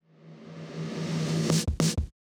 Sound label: snare fill; Multitrack